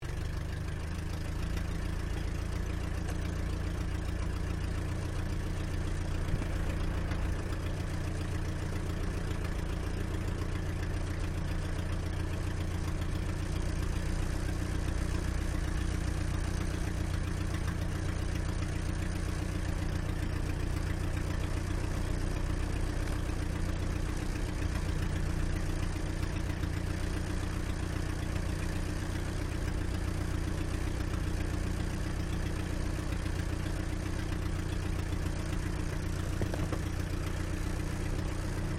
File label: Aircraft Dehavilland Tigermoth Idle Preflight Interior, RAAF Tigermoth biplane; RAAF Tigermoth biplane training aircraft